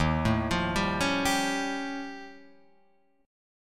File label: D sharp 9th Flat 5th, Guitar, Drop D; 1,0,3,0,2,3